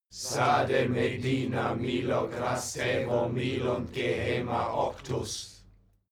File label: male crowd evoke